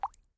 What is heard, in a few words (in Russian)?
voda myagkij blizkij